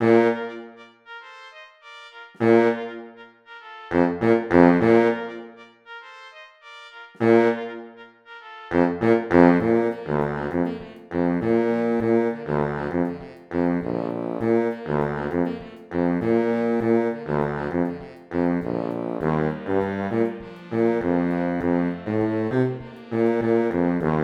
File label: B tuba; Borgertog2019